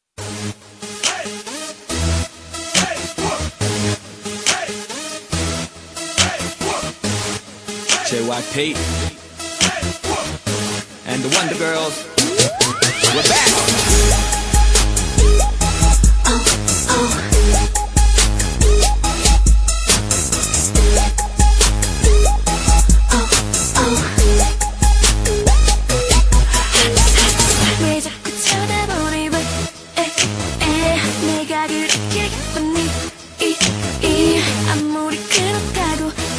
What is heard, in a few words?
south crunk mix